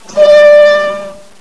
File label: Klaxon, Train; OpenBVE